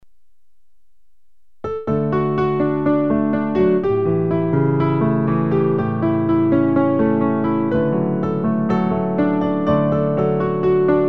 piano

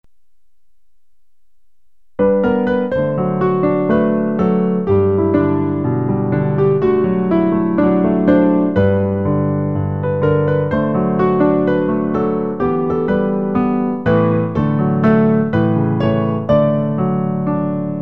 dans mon coeur chante une melodie piano; recueil Louez Dieu

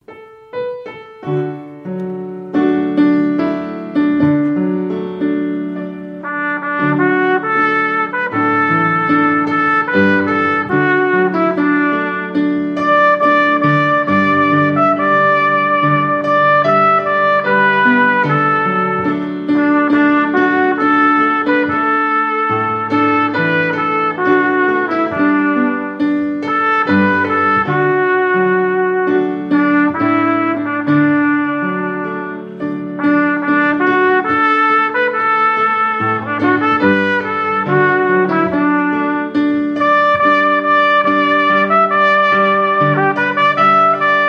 trompette

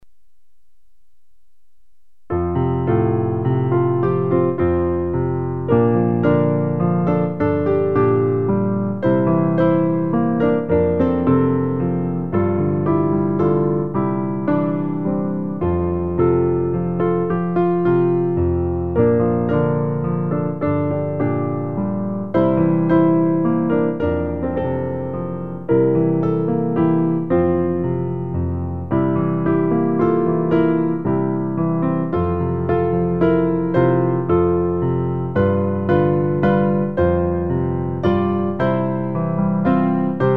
piano; recueil Louez Dieu

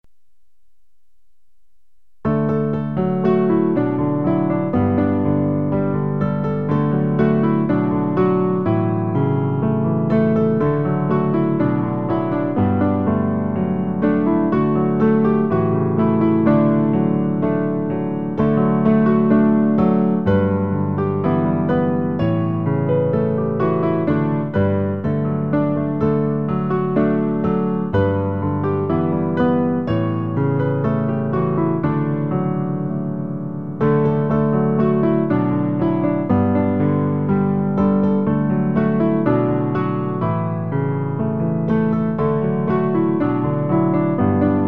piano

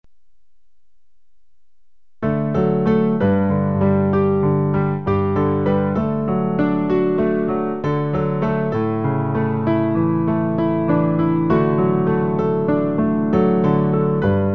soleil celeste piano; recueil Louez Dieu

